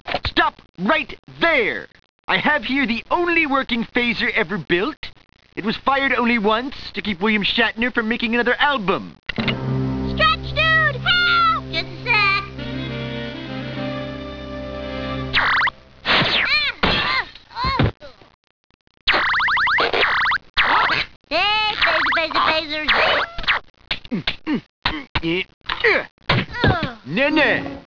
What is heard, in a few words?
phaser; Collector; Stop right there! I have here the only working phaser ever built.